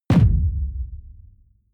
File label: sci-fi shot tonal sub noise dull; schusswaffen